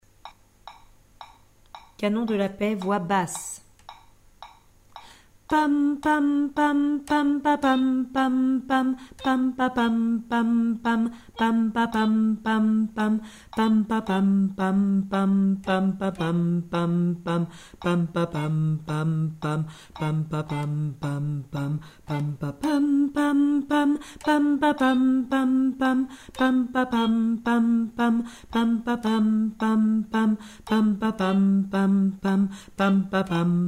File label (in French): hommes